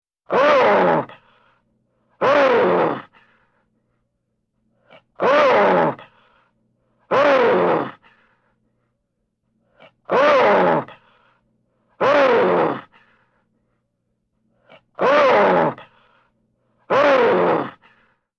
Human Wolf argh

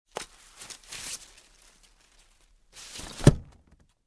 Closing book

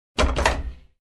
Office Compartment Rattle

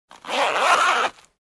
Bag Zipper close

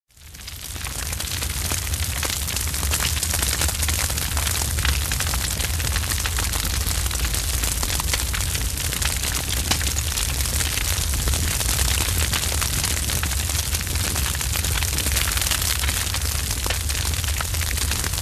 Medium fire burning very close

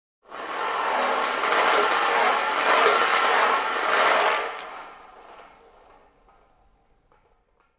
Round metal objects rolling

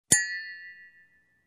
Drinking Glass Hit with spoon

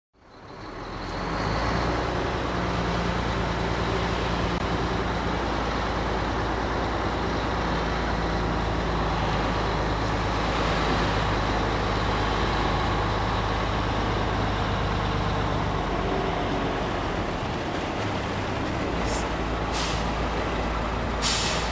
Construction

